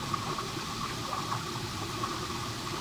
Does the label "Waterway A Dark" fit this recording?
Yes